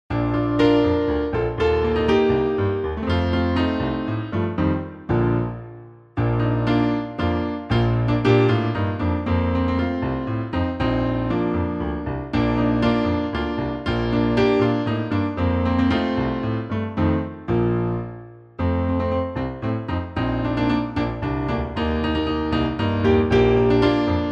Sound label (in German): hymn B-dur; piesni patriotycznej